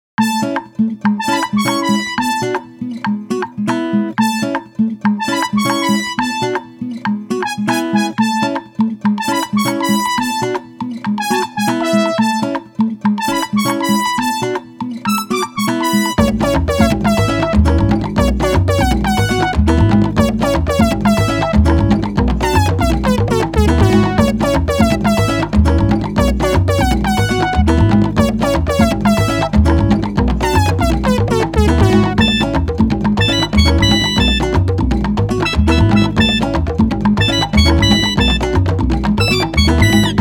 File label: Bright Latin